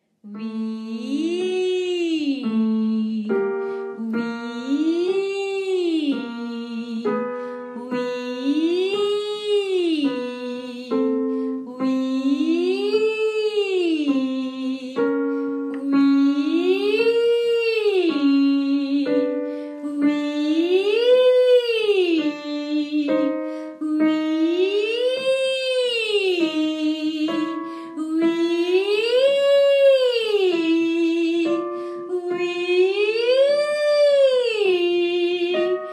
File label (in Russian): Моя распевка на Wee ee